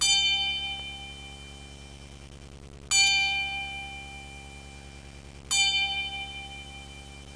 bell; WAV Sound Effects; bells

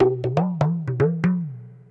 PERCLOOP ETHNO 125; eJay

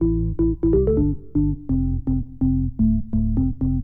ORGAN HOUSE 125 A; eJay; Keys

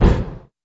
Foul2; RealPool DEMO

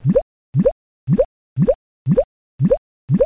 PUMP OIL; Mr. Wonder's Greatest Toyshop on Earth; OmniMedia

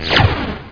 PLASMA; abuse

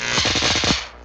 sfx elec; Stunt GP

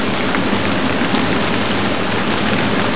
WET RAIN LOOP; PC Gamer IT CD 45; DRIVER

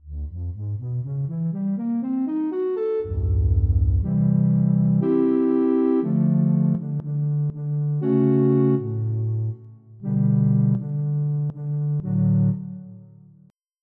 Recorder; EverQuest

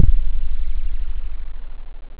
short, synth bass

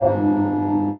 recycle; PC Gamer IT CD 41

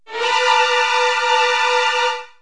Drama Stab; KABOOM! - Wild and Wacky Sound Software; Music; Drama & Mystery

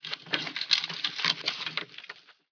dig; Revista CD Expert 45; ZEUS

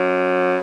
boat; barn

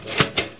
SWITCH; AB3DII

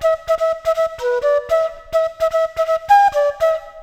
flute, ethno; eJay

